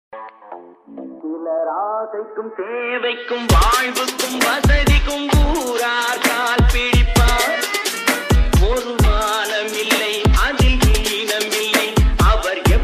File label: remix bgm ringtone; mgr